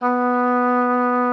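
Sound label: B3, oboe d'amore; Xsample VOL-7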